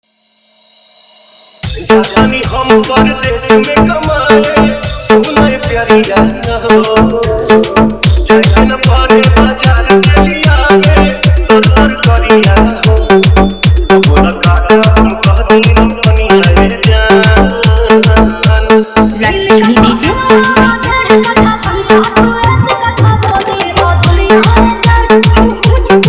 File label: Bhojpuri Dance Remix